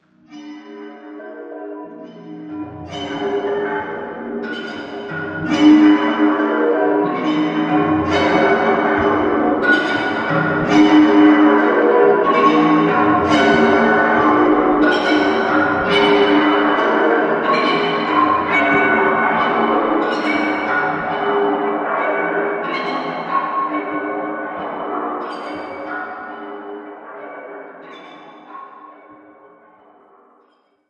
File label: Inside The Darkship, scary soundscape